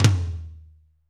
TOM, Drumscapes Roland

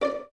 Violins D 5 Pizz; Miroslav Vitous; 11 Violins

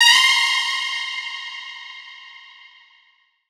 A Str, Chords Tones; Equipped Music; Loungin House

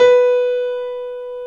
WARM PIANO

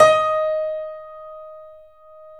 D#, 3-D Audio Collection, 3D Pianos, Yamaha C7

